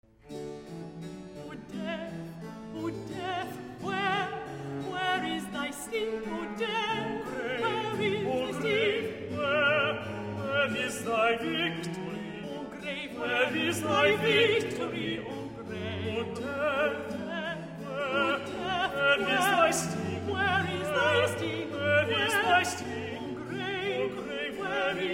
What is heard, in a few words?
O death, where is the sting - Alto and Tenor Duet; Orchestral; Boston Baroque; Handel Messiah; Part III